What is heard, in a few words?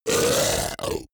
beast choke grunt